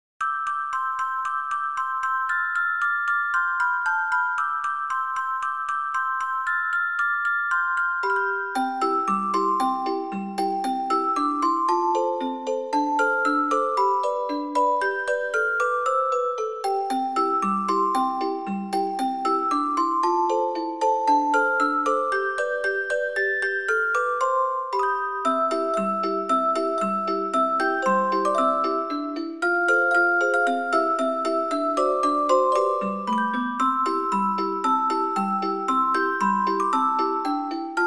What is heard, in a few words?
jingle bells